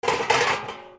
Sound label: KITCHEN